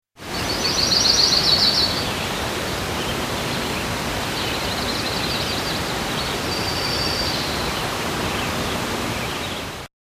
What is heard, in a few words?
Forest; Effetti sonori